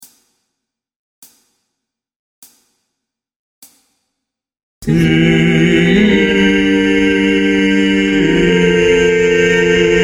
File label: bass 1 predominant